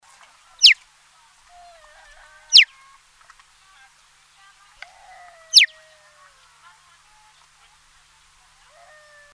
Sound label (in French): chocard a bec jaune